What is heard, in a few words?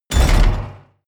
metal heavy impact